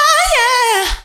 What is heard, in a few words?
oh yeah, female vocals; PlatinumPhattCD2; E-MU Producer Series Vol. 8 – Platinum Phatt